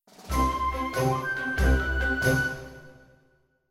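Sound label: sting sales success; The Sims 3; Windows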